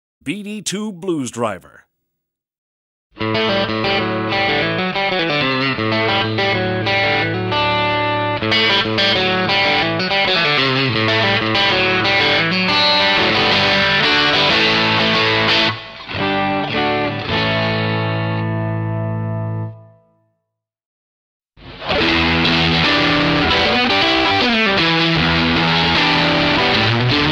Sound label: BD-2 Tone